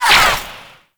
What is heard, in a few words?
Ranged Weapon Whizzby; Warframe; Necramech; Weapons